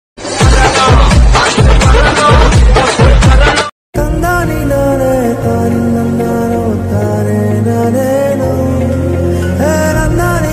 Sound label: mom love from son bgm ringtone